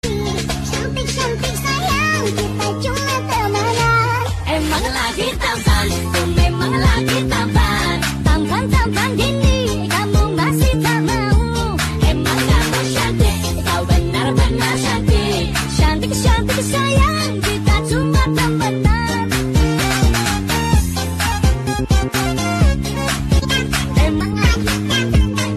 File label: funny ringtone; tik tok